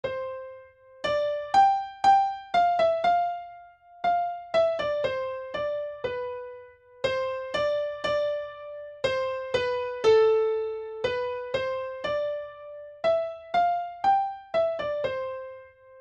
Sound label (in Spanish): melodia